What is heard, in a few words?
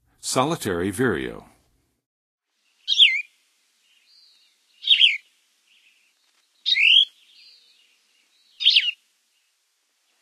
Solitary Vireo, Songs of Birds; North America; Western USA; Western USA Songbirds